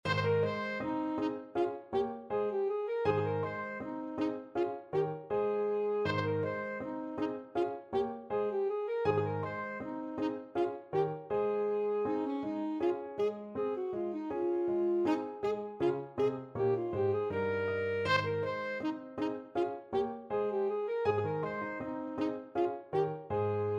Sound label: gavotte ASAX, saxophone